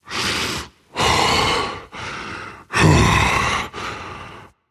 breath rage; chaac

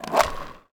skateboard roll air; smite; osiris

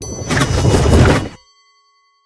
Arcane Deploy; smite; god merlin

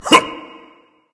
grunt jump berserk; cuchulainn

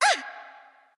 NV Ouch; smite; awilix

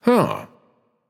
notice; smite; achilles

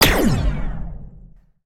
basic fire; smite; Anhur

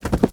ox step; smite; god gilgamesh

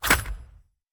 scorpion attack claw; smite; npc

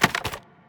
mvt armor; smite; arthur skin9

